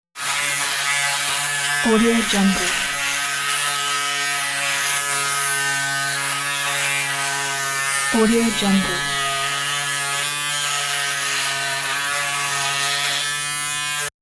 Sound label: electric razor